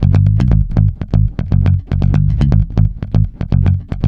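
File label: G#, groover, bass; Spectrasonics; Bass Legends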